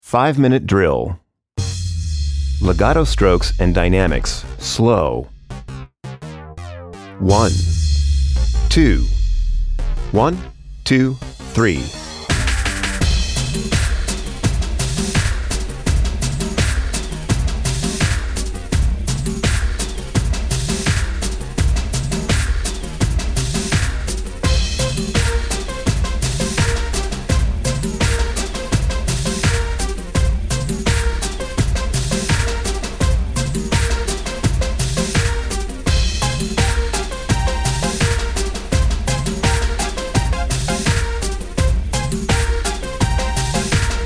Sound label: pad legato dynamics slow